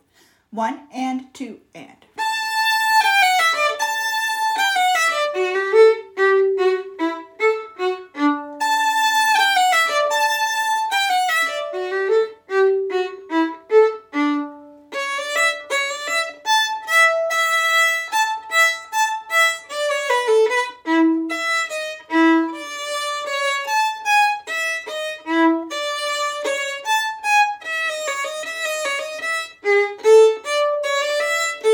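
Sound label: Musette violin 1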